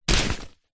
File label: foley luggage camera bag grab pick up; zapsplat